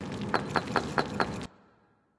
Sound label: free Quick Spin; secret of the stones